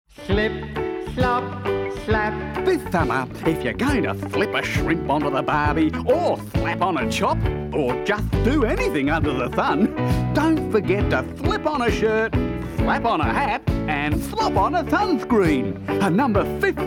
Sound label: Slip Slop Slap jingle; Cancer Council Victoria; 1981